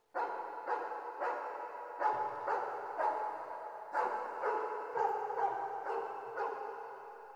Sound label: Dog barks